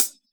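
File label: hi-hat, Drums Acoustic; USB Soundscan vol.10; AKAI; RAW KIT 9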